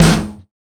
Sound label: Snare, drums; Sonic Foundry (Sony Creative Software); Drums & Drones; Construction Kits; Sludge Kit